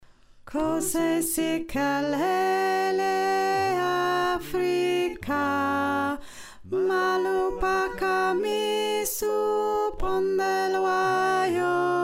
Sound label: Alto, choir